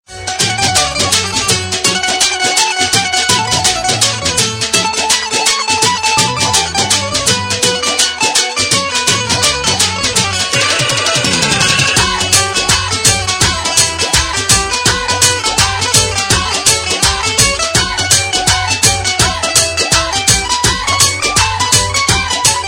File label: Dhol Beat